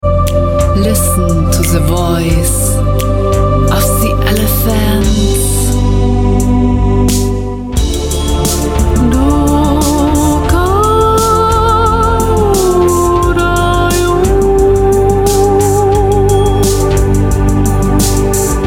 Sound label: voices